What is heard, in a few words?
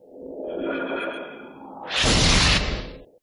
HORN; HotA 1.7.0